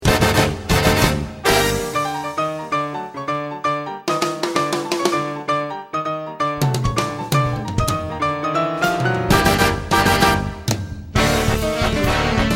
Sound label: Dramatic Samba